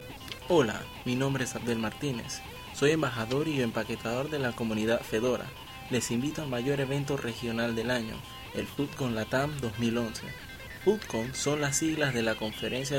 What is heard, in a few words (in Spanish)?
Jingle con Fondo; fudcon panama 2011